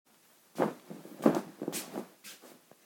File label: walking over a mat